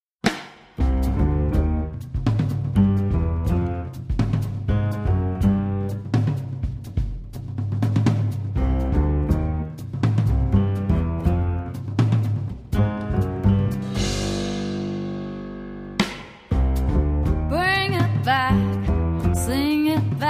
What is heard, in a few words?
Pop 2 Jazz